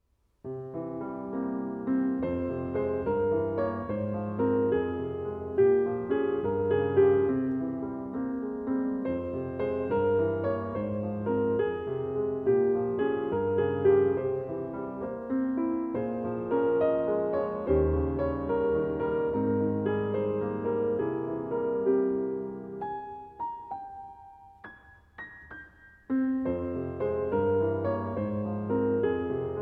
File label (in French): piano seul